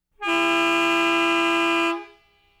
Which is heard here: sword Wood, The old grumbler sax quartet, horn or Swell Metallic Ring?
horn